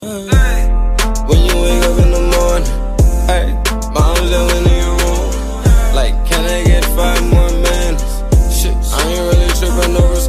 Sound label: wake up ringtone